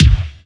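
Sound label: bass drum zome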